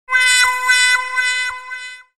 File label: Casino Roulette Lose; Mist Train Girls